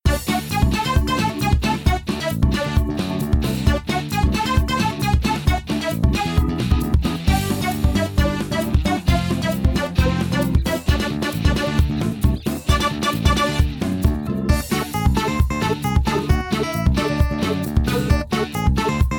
music; The Loud House - Extreme Cardboard Racing; NextlevelGames

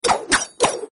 attack; Power Rangers vs Teenage Mutant Ninja Turtles - Ultimate Hero Clash 2; cat

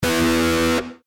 fire guitar; Adventure Time - Elemental; ThrillGames

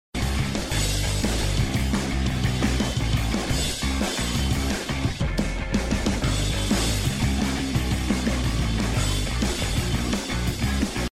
BGM Ingame; The Powerpuff Girls - Mojo Mayhem; NextlevelGames

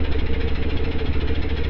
Bike Stop; Teen Titans go! H.I.V.E. 5 Robin Vs See-More

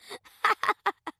score laugh; gumball; Gumball - Go Long!

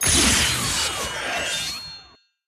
highscore new; run-dorothy

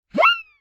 Normal Throw; Bugs Bunny - Batter Up!

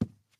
run step l wood; Ben 10 - Hero Time Game; ThrillGames

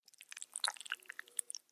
slime; Adventure Time - Elemental; ThrillGames

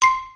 sfx points; Looney Tunes - Recess

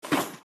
fall; Looney Tunes - Avalanche Snowboarding

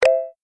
start button; Food Farmer

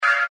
Button UI; Ben 10 - No Arm Done

